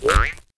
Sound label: bounce; fallguys